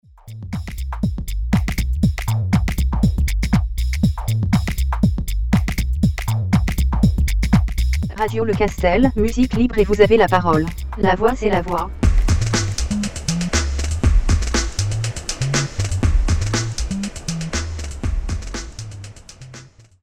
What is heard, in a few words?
Jingle; RLC